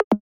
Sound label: click